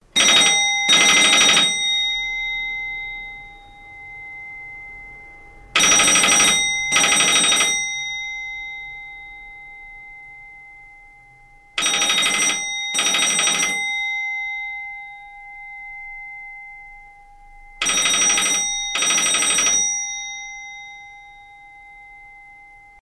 Public Uk Telephone

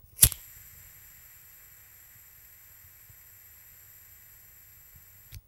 Colt Lighter

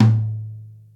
Mid tom drum

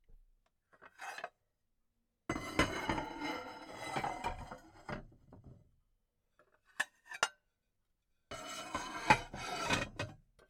Glass Plate Sounds

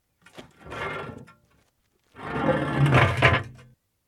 Manhole

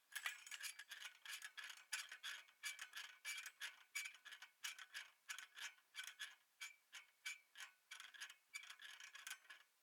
Swing Set

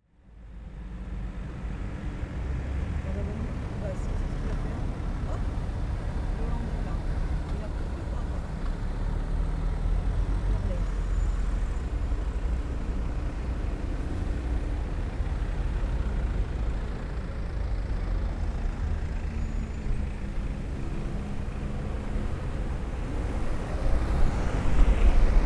Traffic Jam

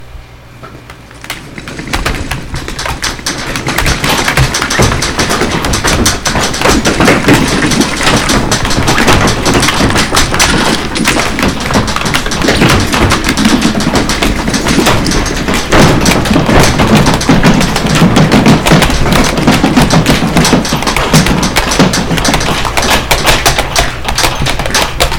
Hard Earthquake

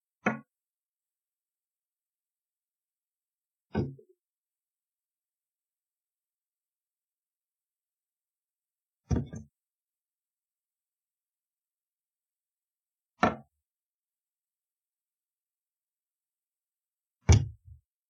Placing Objects On Desk

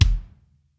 Throaty Kick

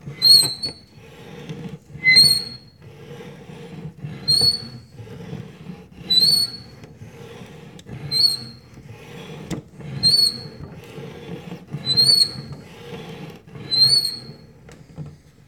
Doors Moved By Wind

